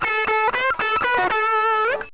GUITAR

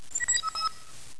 CHIME

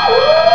ALARM

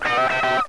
GUITAR

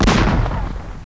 EXPLSION

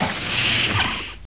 DOORS

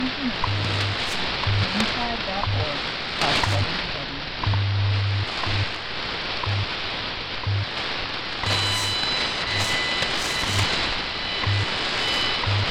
WWV Atmospheric Propagation Experiment 5000kHz

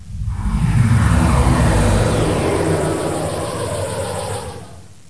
DECLOAK

